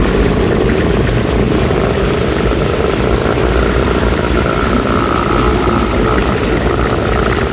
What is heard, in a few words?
blood laser long loop